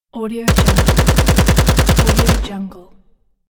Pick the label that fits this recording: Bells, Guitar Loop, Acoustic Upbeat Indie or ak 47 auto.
ak 47 auto